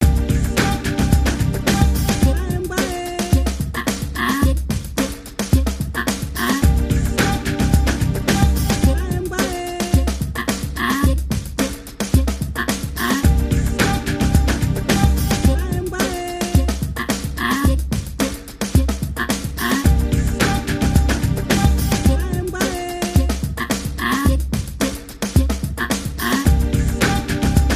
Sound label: Forest Loop